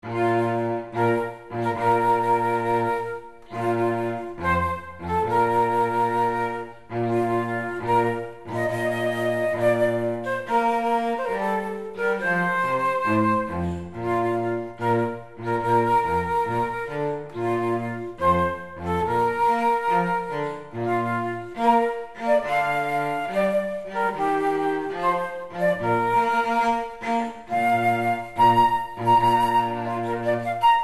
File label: flute cello duo bridal chorus